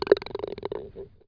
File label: ball into hole